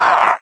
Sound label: pain, revanent; doom3